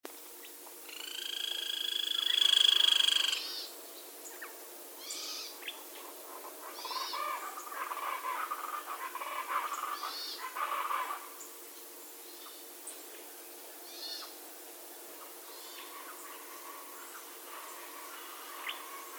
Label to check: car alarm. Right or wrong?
Wrong